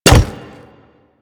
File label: Pet Step Default; Warframe; Alad V Pet